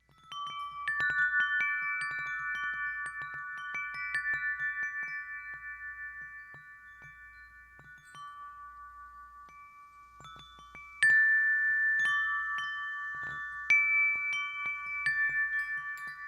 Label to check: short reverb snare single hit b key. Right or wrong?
Wrong